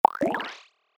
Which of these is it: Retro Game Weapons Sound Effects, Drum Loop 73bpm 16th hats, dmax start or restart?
restart